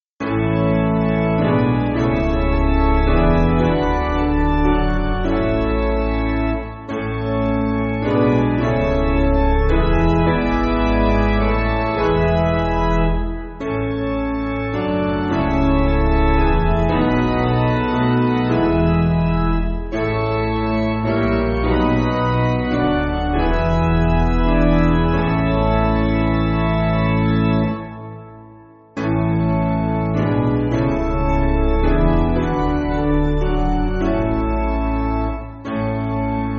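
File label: Piano, Backing Files for Churches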